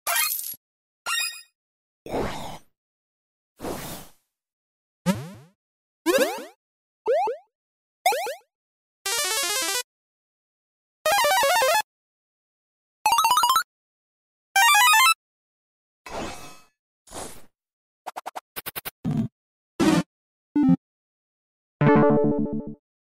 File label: Children's game